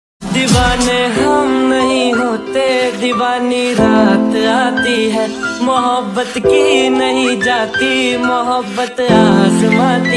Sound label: Hindi Song Ringtone